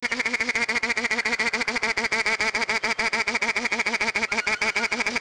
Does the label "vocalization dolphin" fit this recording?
Yes